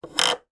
musical synthesizer pitch bend wheel bend and release